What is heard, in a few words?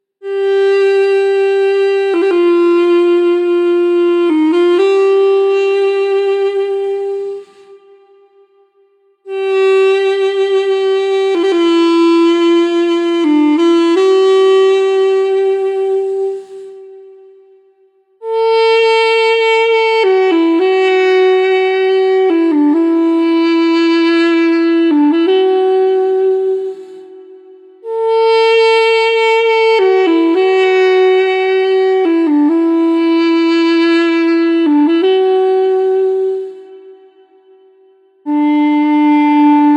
Sad Islamic background music Duduk